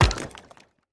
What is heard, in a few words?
car stone impact; factorio 2.0.55